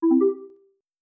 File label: start